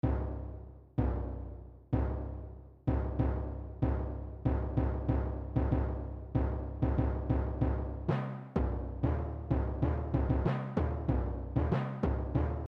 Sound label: Reach Deep Timpani